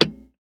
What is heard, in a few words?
8-track step in